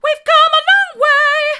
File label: we've come along way hard, vocal